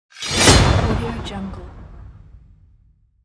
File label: medieval sword impact hit